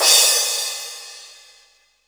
CRASH; JV-1080 DRMS; EdgeSounds; Drum Mashines VOL-1

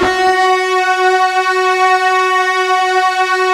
FUZZ F#3, Guitar; ULT FZZ LOOP; East West; Steve Stevens Guitar Samples Collection

